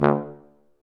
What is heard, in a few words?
F TUBA, stacc; Roland L-CDX-03 Disk 2; BRS Tuba